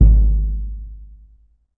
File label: EDGE TONE, Surdo